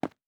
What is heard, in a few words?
Footstep Concrete Running